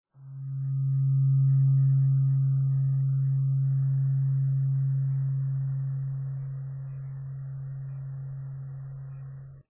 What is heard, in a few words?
Om Fork; tingshaws